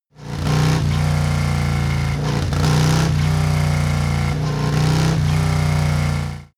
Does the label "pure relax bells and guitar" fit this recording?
No